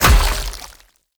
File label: Polearm Large Impact Flesh; Weapon Impacts; Enemy